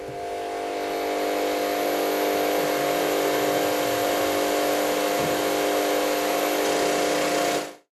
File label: radio